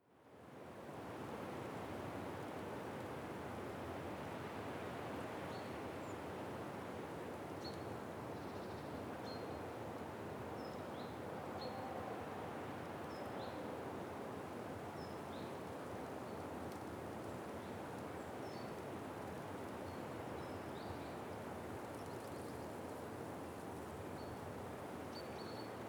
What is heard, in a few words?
birds quiet wind